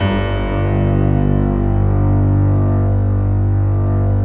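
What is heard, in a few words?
G 1, PIANO STRINGS; Best Service; Advanced Media Trax Modern Composer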